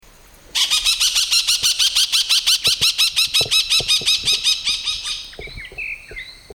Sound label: malabar Parakeet